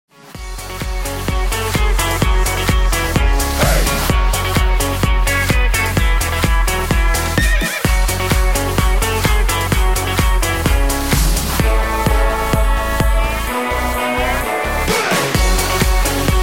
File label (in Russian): barmen instrumental